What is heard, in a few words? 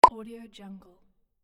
simple pop